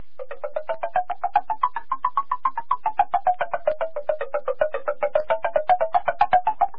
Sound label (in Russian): perkussiya melodiya derevyannaya vverh i vniz